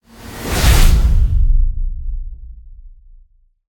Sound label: TRANSITION Medium Rumbling Wave; SmartSoundFX; Krypton